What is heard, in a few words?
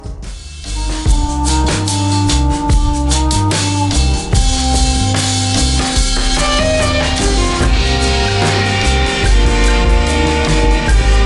rainy night jam; Smooth Jazz Online